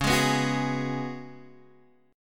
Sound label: Gb Suspended 2nd Suspended 4th Db